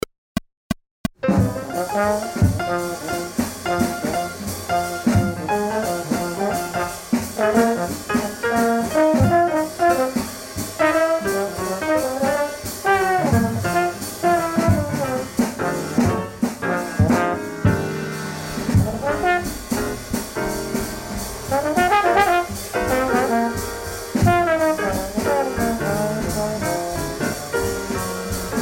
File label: Blues In F 176 No Bass